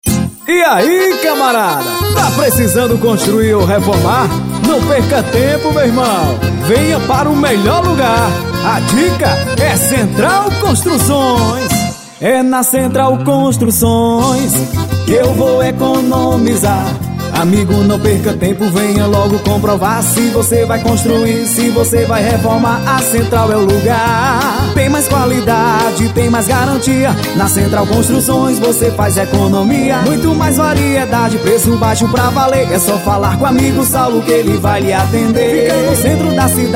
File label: JINGLE; CENTRAL CONSTRUÇÕES